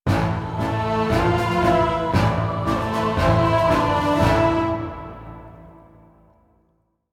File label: Legendary Stinger; Cariel Roame